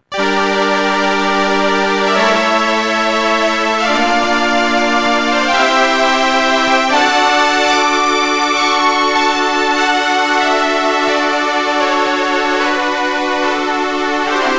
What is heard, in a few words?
eminent 310 strings